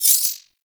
Glass Shotgun Shot Prime Layer A; Warframe; Tenno

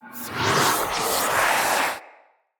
Staff Caster Charge Heavy; Weapons; Player; Staves; Wazzard Staff Caster

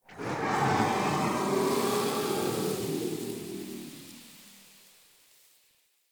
Ext Wind Gust Calm Toxic Throat; Ambience; Misc Outdoor; Warframe; Infested Eris

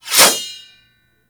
Tonfa Equip; Warframe; Tenno

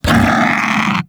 Undazoa Vocal Pain; Warframe; Hunting Critters; Characters

